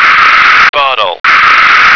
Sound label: bottle